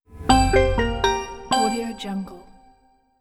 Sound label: short ident logo